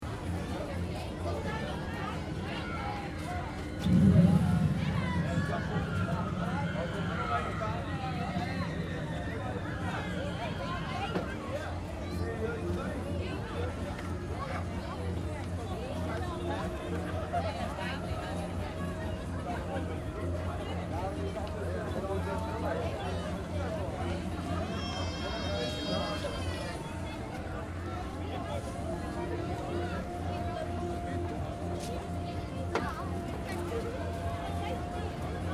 Fairground Ambience, Crowd walla at fair ground amusement park - muffled; Crowd Sound Effects; music pumping - distant screams from rollercoaster